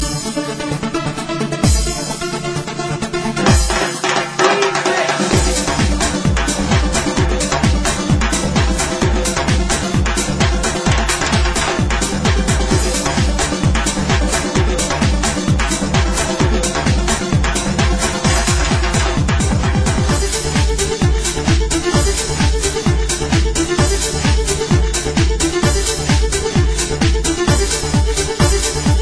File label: Electro Lezginka